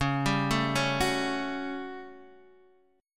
A 13th Db, guitar chord

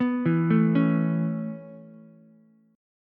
Db Minor 7th Sharp 5th Db down Ukulele; arpeggiated; JGuitar

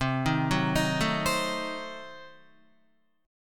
C Diminished 7th down Guitar; arpeggiated; JGuitar

